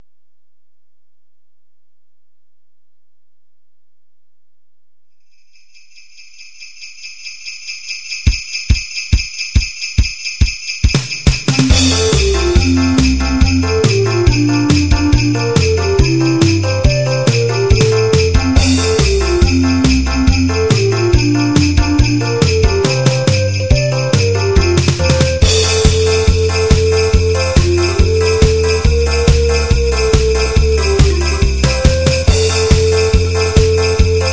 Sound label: jinglebell